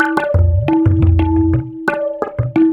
TABLAS; Zero-G; Chemical Beats

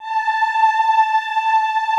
AHH A4, Classical Choir; Best Service; Extended Classical Choir

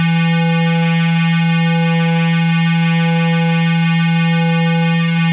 Cigar Feedback 90 E, guitar drone

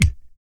GLOTTAL, vocal; ILIO; Vocal Planet VOL-2; Blues & Soul

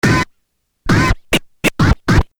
Scratch; Sonic Foundry (Sony Creative Software); Hip-Hop Street Beats Construction Kit; MAC MONEY

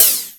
High Hat, E-MU Pro-Cussion

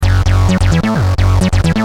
Driven, Bass; Industrial

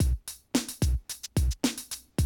110, Classic Drum Loops

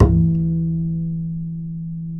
E 3, bass, acoustic fx; Spectrasonics; Bass Legends

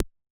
Yamaha DJX 2B, D Pulse

